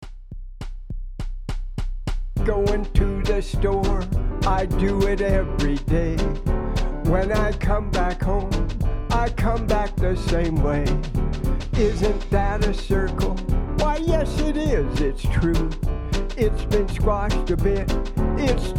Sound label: Circle Polka